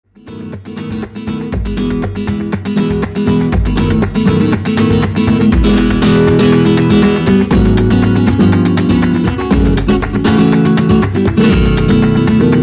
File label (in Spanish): Preludio Rumbas